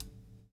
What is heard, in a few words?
PULL, Backbeat; Spectrasonics - Backbeat